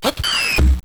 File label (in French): tombe; loderunner